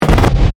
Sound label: Scratch Hit Record, Dj; DJ (Sound Effects:Musical); BlastWave